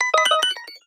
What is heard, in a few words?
cosmic